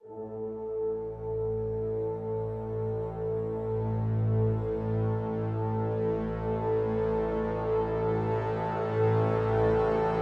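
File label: Crescendo Brass Swell Full (A)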